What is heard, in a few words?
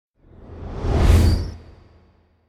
Whoosh By Swift Fast Deep Whistle Reverberant; Hollywood Edge; sound designer's toolkit v2